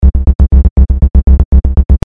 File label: LFO Rhythmic Patterns and Pulsating Basslines; low frequency oscillator